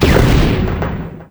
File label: Explosions Explode Can; DCS